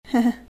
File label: nervous laugh